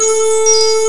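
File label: ambient pad, synthesizer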